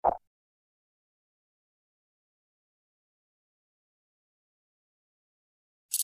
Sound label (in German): filmmusik